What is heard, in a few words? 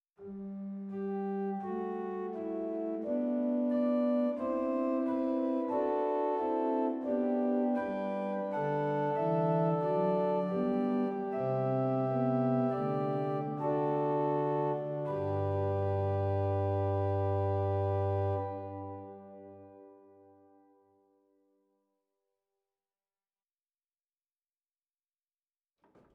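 Bovenpositief Praestant 8, organ; zwolle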